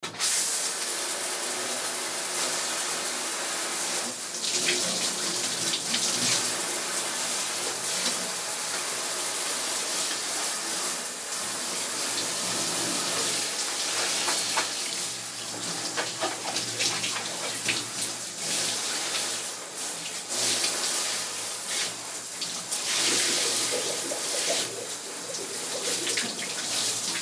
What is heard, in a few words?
taking a shower